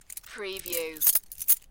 Key ring movement

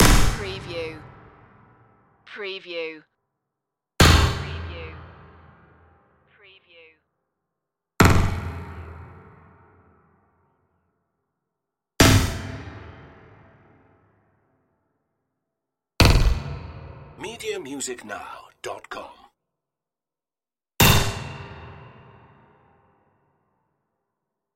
sci-fi metal impacts

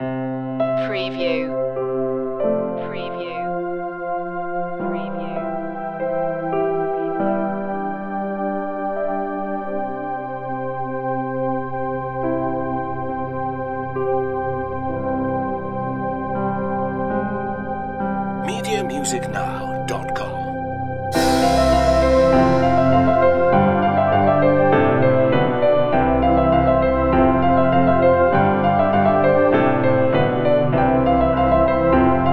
sad then happy

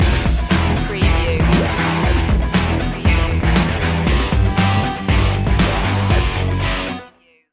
thunder stinger

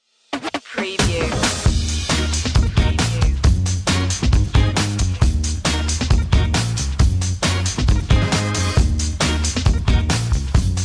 the funky stuff no horn or guitar

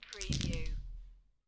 Dice Throw Five Dice On Felt

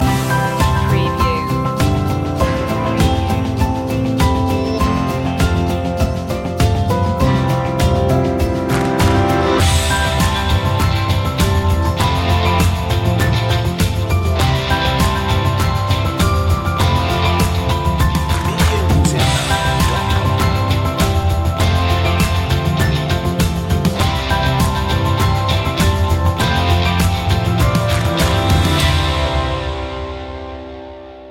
no vocals

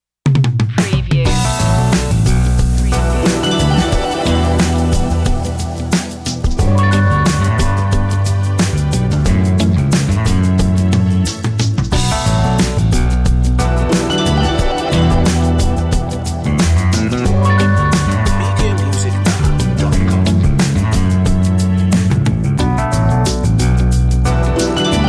slow funk reggae

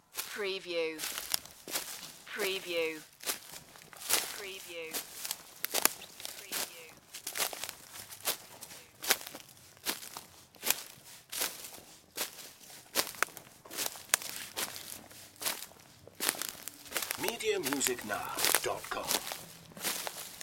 Footsteps Dry Forest

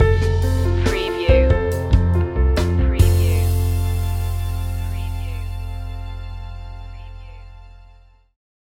acoustic mood stinger

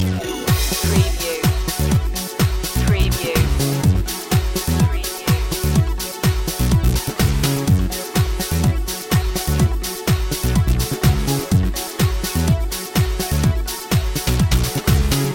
digital stream loop

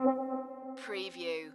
musical

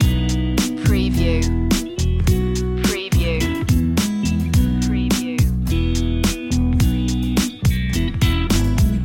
bouncy beat loop

